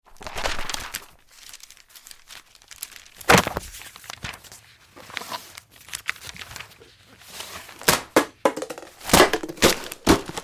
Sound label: Paper Sounds; Big Room Sound